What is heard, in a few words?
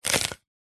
pepper single chop with knife on wooden chopping board; zapsplat